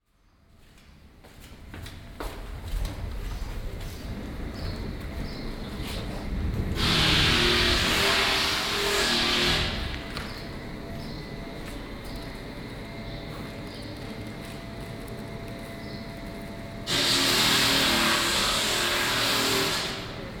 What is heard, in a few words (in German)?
muell; thesoundofgentrification